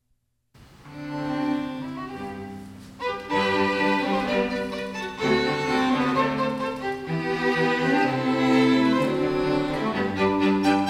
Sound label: Excerpt String Quartet G Major; Haydn; String Quartet 29; op 33 No 5